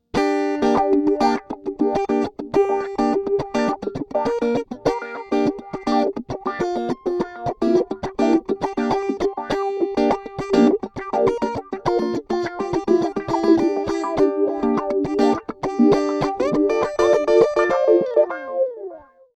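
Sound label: Guitar Filter; ReTracer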